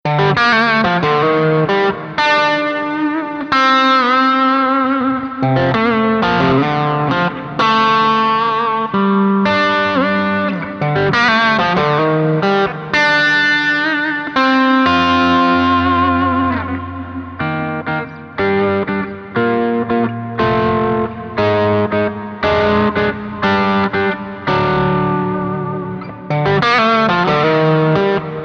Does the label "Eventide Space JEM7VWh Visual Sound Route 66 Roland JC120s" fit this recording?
Yes